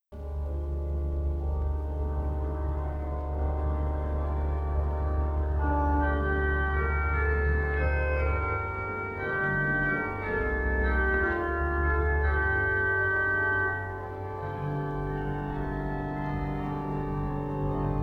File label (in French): Improvisation à l'orgue